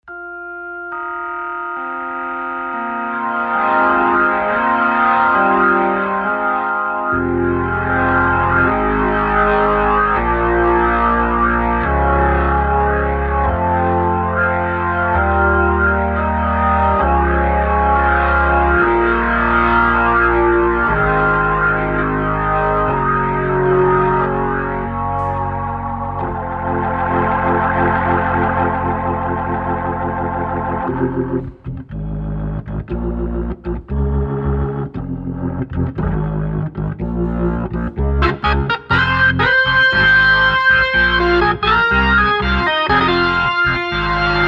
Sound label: 122xb Rock, hammond organ